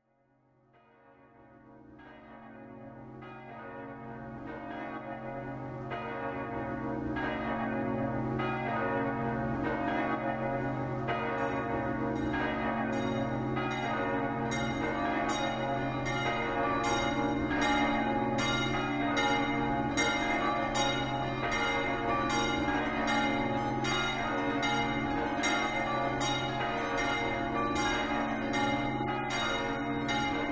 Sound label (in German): glocken mix